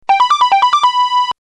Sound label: level10